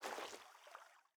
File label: footstep water med; Rust